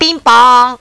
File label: pinpon